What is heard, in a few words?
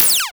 close, Interface Sounds; Kenney's Sound Pack